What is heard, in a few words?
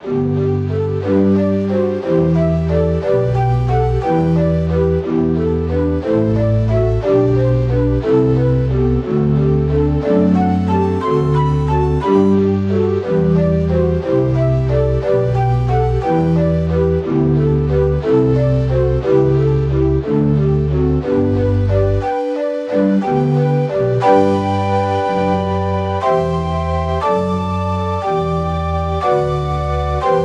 shakuhachi